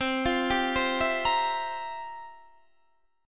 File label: F Major 7th Suspended 2nd Suspended 4th, C, guitar